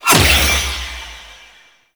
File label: Shackle Impact; Warframe; The New War; Deacons